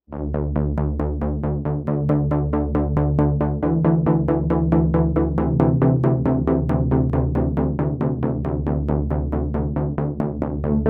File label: Pulsing Chord